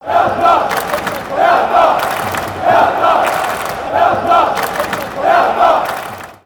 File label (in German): Torhymne and Fangesang; hertha bsc berlin